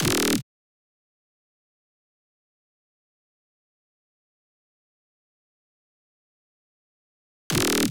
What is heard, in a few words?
128 BPM D# Min Synth Stab; House; Drop Loops; Loop Stems; Cymatics